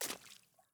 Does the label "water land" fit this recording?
Yes